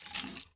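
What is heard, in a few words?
Inspect Shell Movement; Valorant; odin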